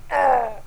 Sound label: pain, csgo french bot; csco